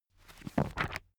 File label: book browse smooth